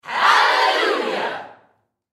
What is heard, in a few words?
Human Crowd Studio; Crowd Reactions Hallelujah Loud; Blastwave